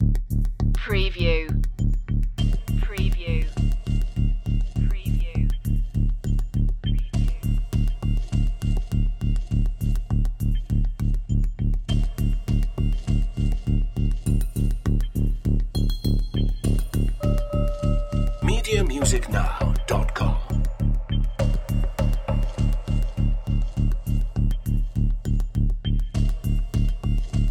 drum and bass

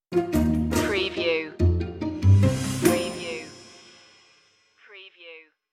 curious machine stinger

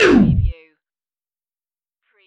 stopper

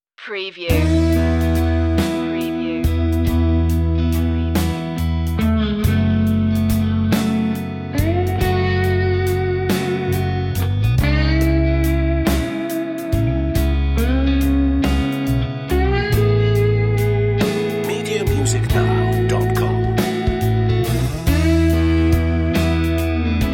sentimental country theme

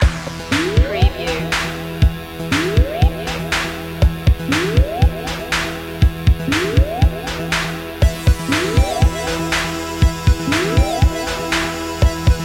vintage electro sound loop

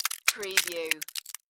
Ice Crack; Sound Effects Bible

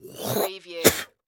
Spit Male

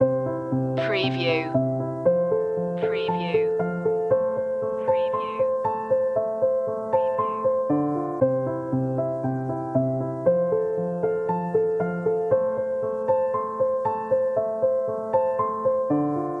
travel the distance loop

